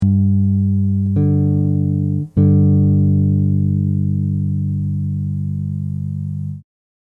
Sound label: Perfect Fifth, bass; chords and double stops on bass